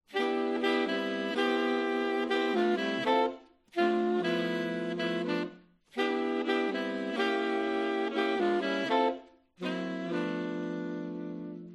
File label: Saxes